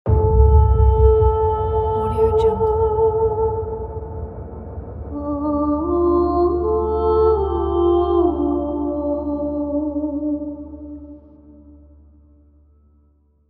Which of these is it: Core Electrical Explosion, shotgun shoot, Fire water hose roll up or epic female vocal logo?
epic female vocal logo